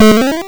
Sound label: Hard PCM Chip; chiptune